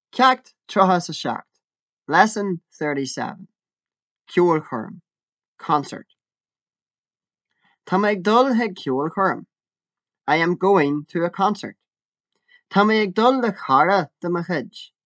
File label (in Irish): ceolchoirm